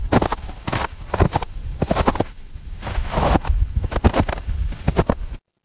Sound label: footsteps snow